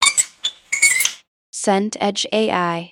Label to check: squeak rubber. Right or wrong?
Right